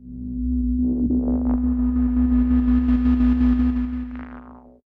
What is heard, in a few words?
Filter Rise